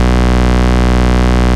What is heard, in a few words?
Root B, Ultimate Bass; Vengeance Samples